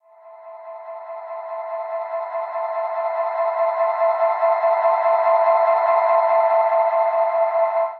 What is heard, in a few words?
Cyber Angel Drone; Instruments; Laboratory